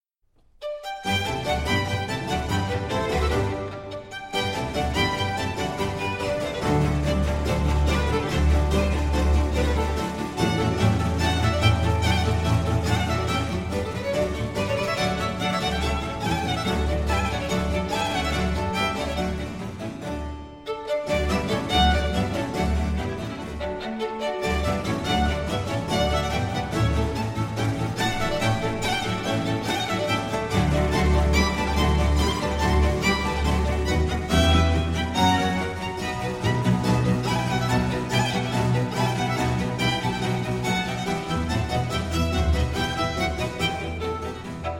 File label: Concerto for Strings in G Major, Allegro; Vivaldi; Concerto Italiano